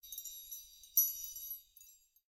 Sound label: Gold Ore; Blind Quest - The Frost Demon